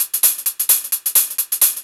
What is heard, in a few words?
hihat 2, 130, drum loops